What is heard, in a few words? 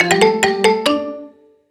Audio Logo; Loyalist College